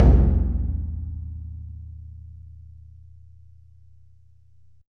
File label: Kodo Drum Hits; Hits & Tones